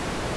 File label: jet pack loop